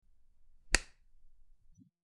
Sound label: Slap